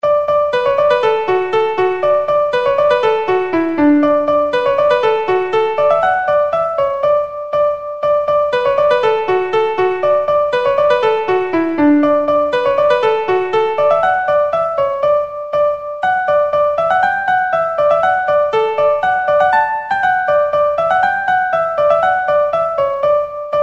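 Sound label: Armagh Polka, Traditional Irish Music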